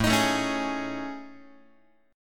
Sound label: A Major Sharp 11th, Guitar; AM#11 strummed